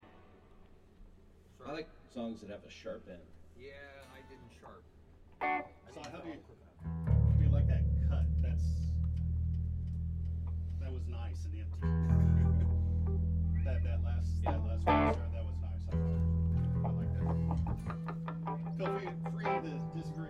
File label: Room Mic